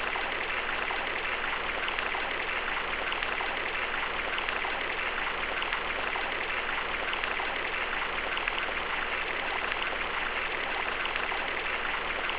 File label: water noise; conan